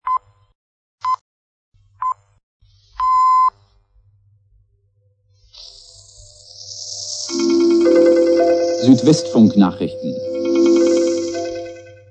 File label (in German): nachrichten, jingles